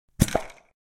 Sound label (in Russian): zvuki barnogo sheikera